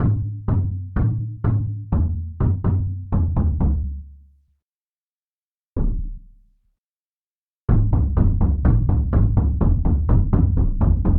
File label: taiko drum